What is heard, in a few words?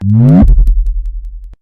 swoosh